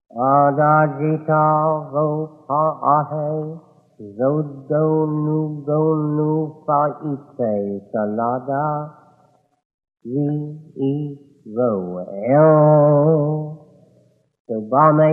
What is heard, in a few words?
crow